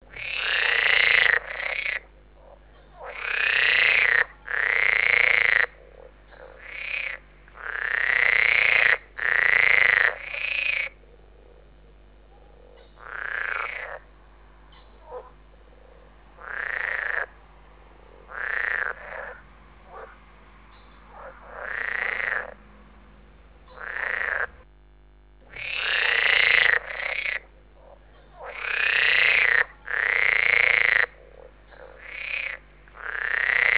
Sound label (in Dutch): groene kikker paringsroep